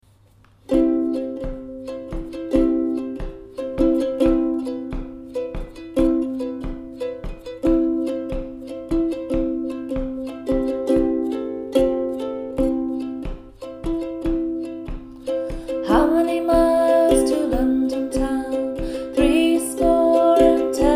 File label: How Many Miles to London Town sung, with ukulele accompaniment